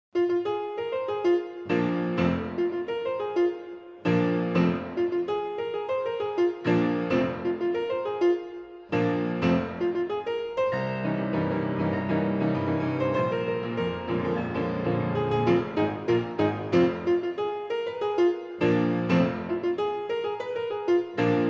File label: Grand Piano